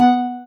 soft hitwhistle; osu

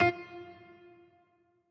piano riff intro

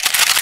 reload; osu

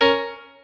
piano

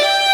violin; osu

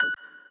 lead

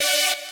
saw

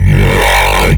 Growl

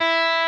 Arp.

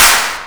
Snare Clap Low

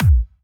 normal hit whistle

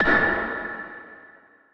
Drop (A5)

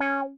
C#5 meow

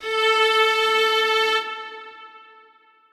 Strings kiai

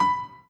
Piano Chord

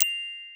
gloken

